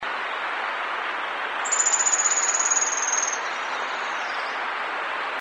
Carpinterito Común, White-barred Piculet, Picumnus cirratus; cantos